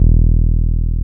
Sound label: TB303, TB-303 BASS